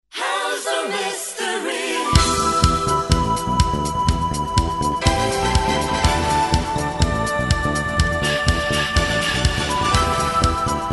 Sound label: Lead